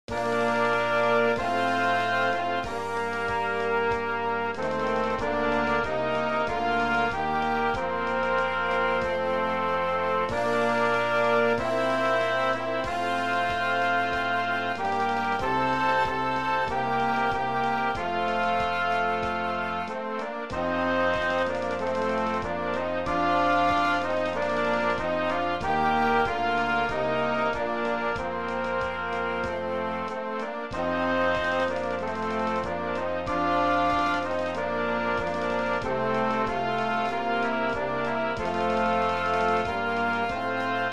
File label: O Canada playback